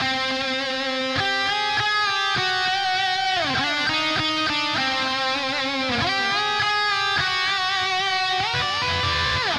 Drums and Jingles Evil Lead

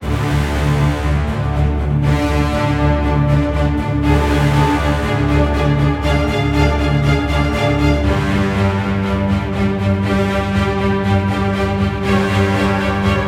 Epic Battle No Drums; Dual Blade: Battle of the Female Ninja

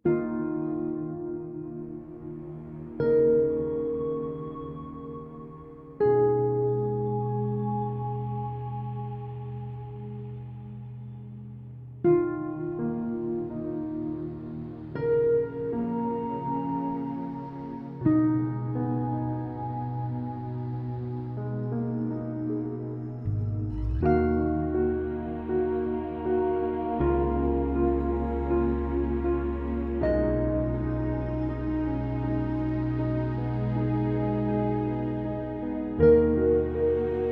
Mood Farewell Heavy

